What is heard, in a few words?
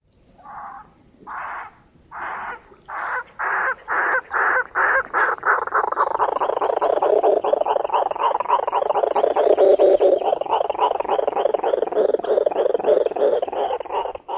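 churring and gargling ground calls; AK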